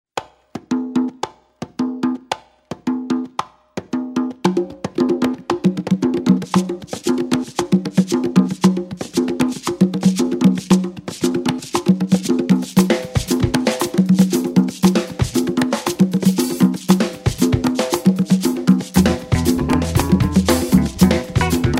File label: Afro-Latin Jazz Ensemble, Afro Beat; Iyesa Afro Beat